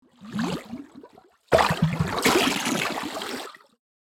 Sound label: Underwater movement with fizzle